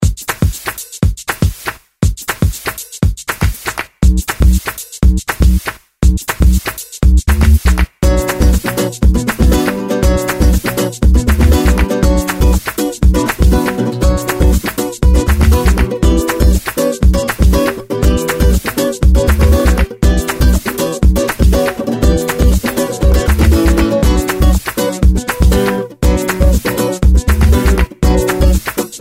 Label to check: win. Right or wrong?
Wrong